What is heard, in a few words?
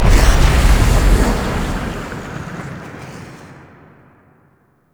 Diorama Whoosh In; Warframe; Tau; Tennocon Relay